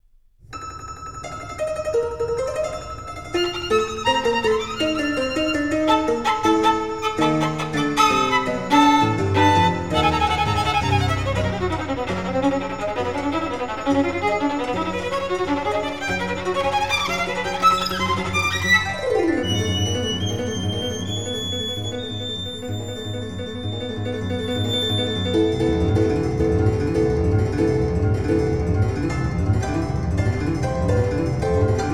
toccata for violin player and piano

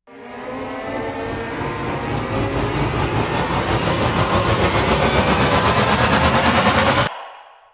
shudder rise

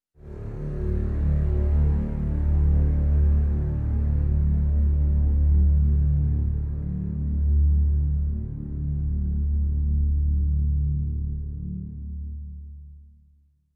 finish up d no hit

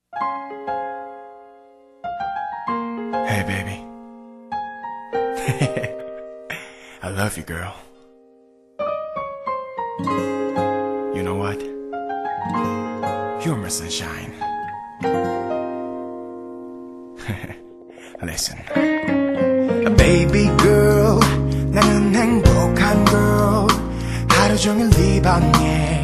acoustic version

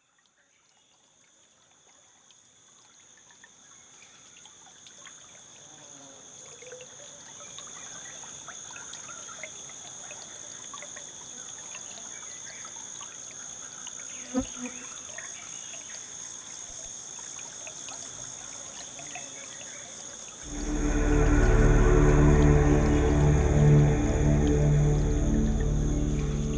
a flute in the stream

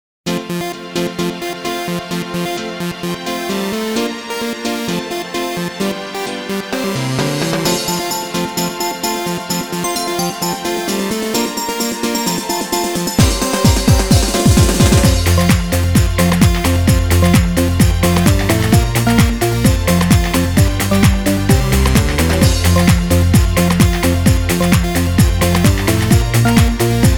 jingle bells instrumental

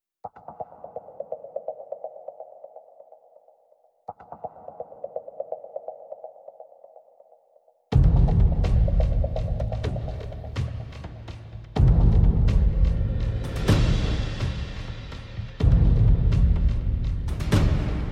perc only

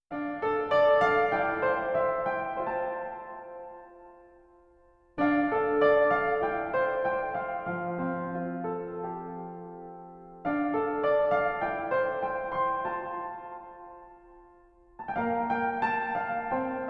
piano

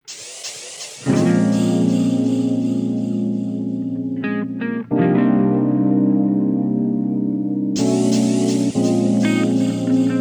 flute mix

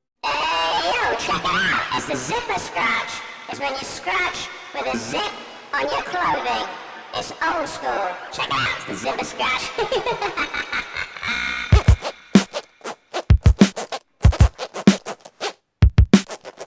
the zipper scratch

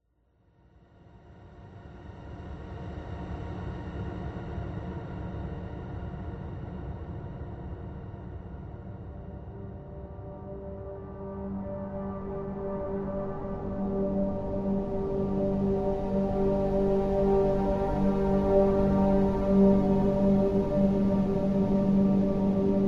ambient

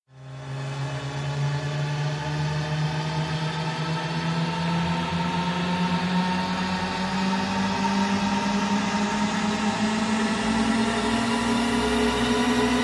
rise long incoming

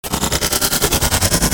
hit complex short

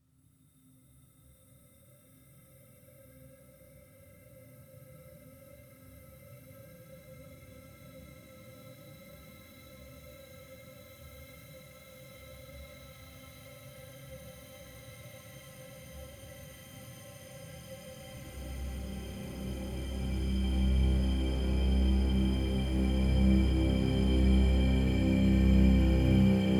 instrumental mix

